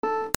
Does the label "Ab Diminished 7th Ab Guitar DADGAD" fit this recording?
No